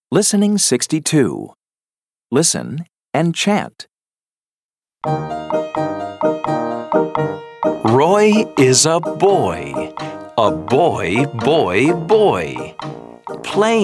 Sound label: Chant